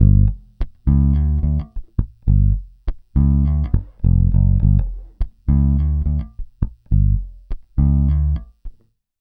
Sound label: Pop, Bass